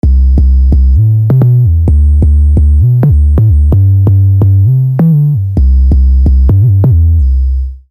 bass

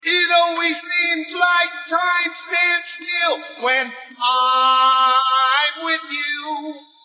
vocals

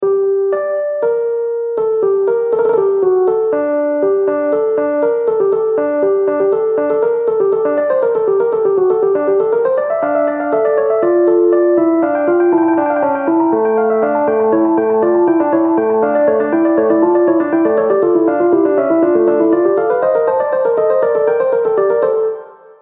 Keyzone Rhodes

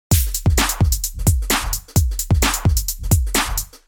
drum

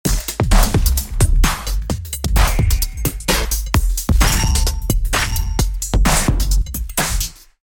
drum rand

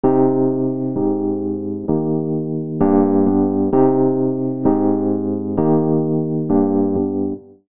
epiano

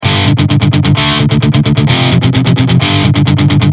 Guitar with amp mic

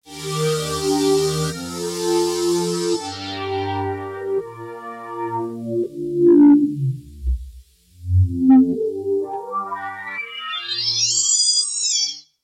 pad

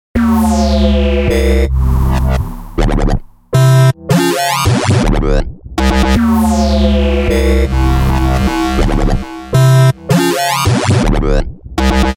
bass; LAZERBASS

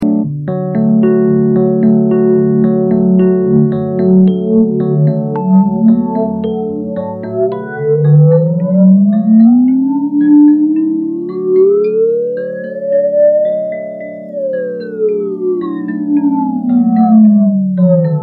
Resonator Loop

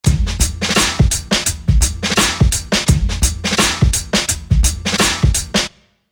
SpaceDrone drum

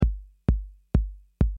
808 Kick

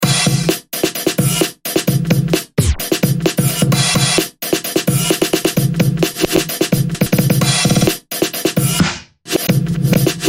Drumloop; FPC; Grossbeat

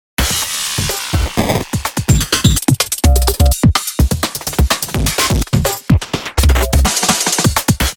drum with fx

